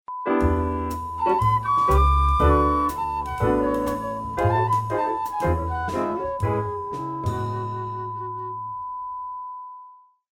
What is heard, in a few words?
groovy jingles